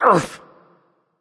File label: grunt hit; smite; voicepack; khepri